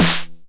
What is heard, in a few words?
909 SD Tape Sat